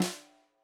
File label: Snare Bottom Mic; One Shots; Drums; Plymouth